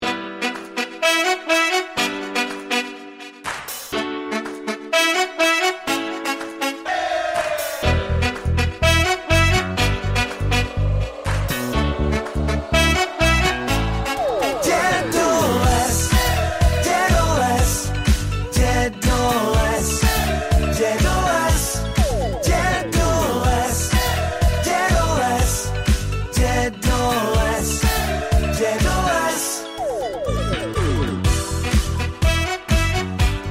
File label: karaoke disco party